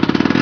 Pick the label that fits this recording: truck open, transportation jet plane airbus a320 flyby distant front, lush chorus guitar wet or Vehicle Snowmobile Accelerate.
Vehicle Snowmobile Accelerate